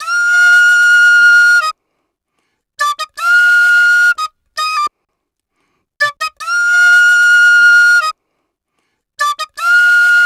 Penny 075bpm Bb, Penny Whistle, African

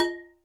Hi Ethnic, Alesis D4 drum machine

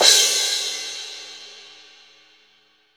Crash Cymbal, MONO CRASH; SAB16 CRS 1

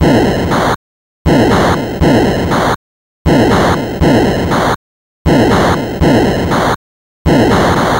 Atari 2600 Noise Drums; 8-Bit; Chiptune

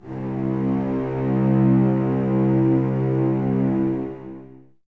cellos C 2, String Ensembles, Expressivo; Miroslav Vitous

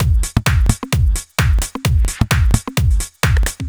130 Hard Mean Half On, Drum Loops 130bpm; Zero-G; Soundclash Nu Dance Electro; Acidized Wavs

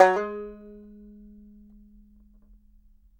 BANJO NOTES, AB3; Sampleheads - Whole Lotta Country 1 & 2

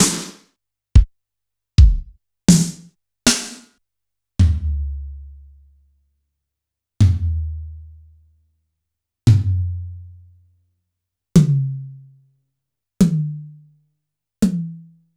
Yamaha PSR-E323 Rock Kit

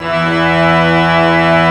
pipe, pipe organs; Greytsounds VOL-1

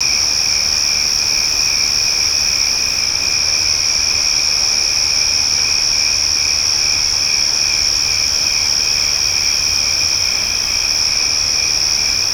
night ambience; E-MU Producer Series Vol. 3 – Hollywood Sound Effects; Ambient Sounds

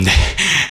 Human Perc, Body Perc; Spectrasonics; Supreme Beats; World Dance

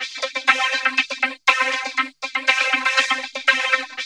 VOC LOOP, VOCODER LOOP; Zero-G Ambient 1

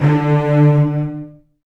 Virtuoso Strings, TRM FX

